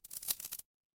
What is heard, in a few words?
glass crack